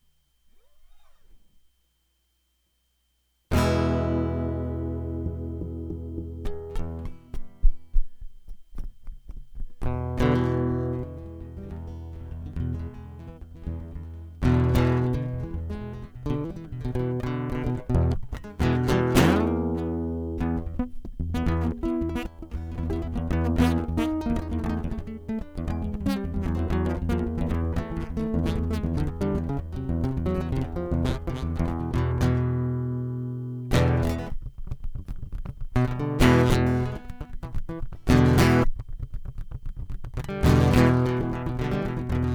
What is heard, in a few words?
Guitar Loop